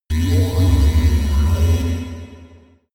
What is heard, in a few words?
space alien construction machine; aliens; soundpool